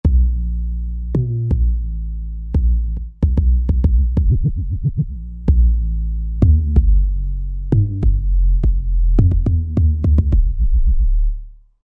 808 Kick Bass, Roland SR-JV80-12 Hip Hop Collection